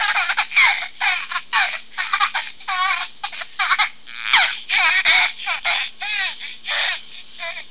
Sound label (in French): Rire, first guffaws; his first guffaws